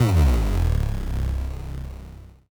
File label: Bass Drop; SoundsCrate